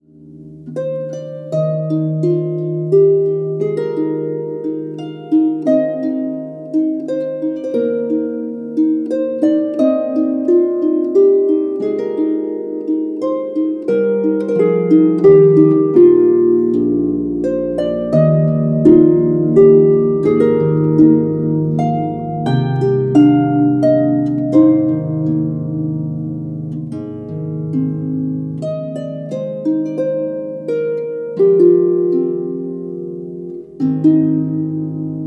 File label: Celtic hymn